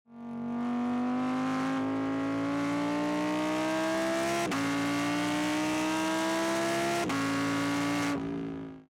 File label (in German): Beschleunigung; ABT XGT; ABT Sportsline